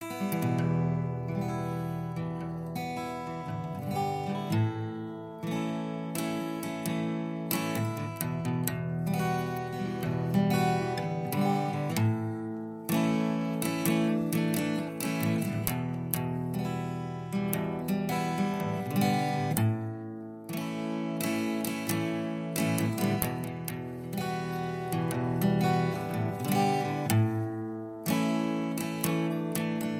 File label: Guitar Breathe